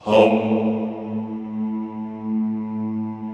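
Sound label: Vohmm Houuu, Chorus; Sound & Vision; Gigapack I CD 2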